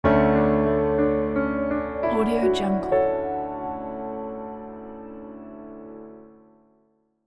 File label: mysterious piano logo